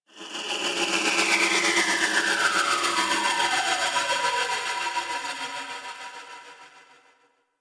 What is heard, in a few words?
Gas City Distant Textures, Ambience; Warframe; Corpus Gas City